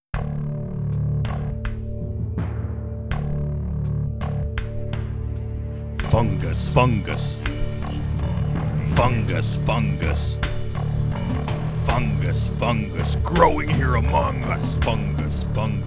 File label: Scratch Vocs